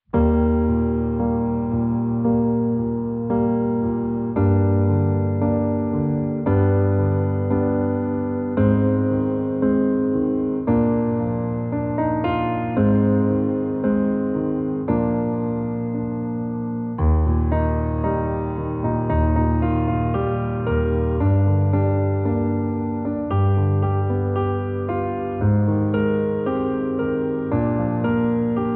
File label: Piano Instrumental